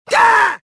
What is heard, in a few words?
death; luke